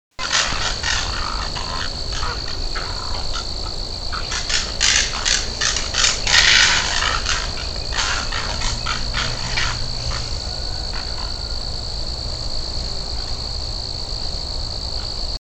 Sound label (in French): Grande aigrette Ardea alba Son; Gran blanc; oiseaux des prairies humides; pointe a retz